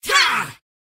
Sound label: attack; Mad Mage; Mob Voice